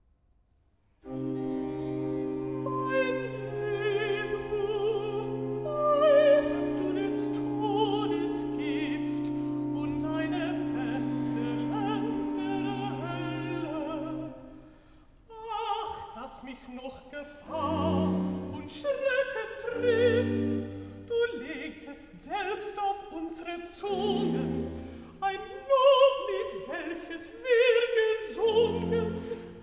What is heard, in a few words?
Cantata, Recitativo (Alt); BWV 67 'Halt im Gedächtnis Jesum Christ'; Bach; Arnstadt; 1st Sun After Easter